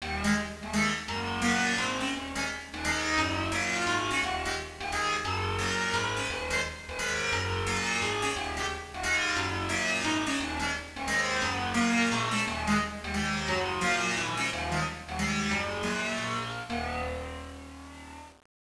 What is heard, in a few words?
STING